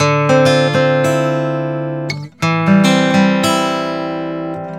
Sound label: GUITAR SAMBA